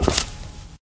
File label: black tile, footsteps